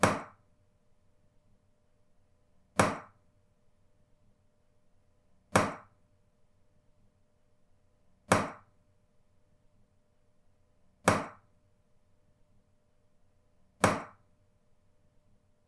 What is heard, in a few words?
leaking shower, シャワー水漏れ; シャワー水漏れ１; wetarea